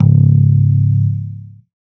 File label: bass stab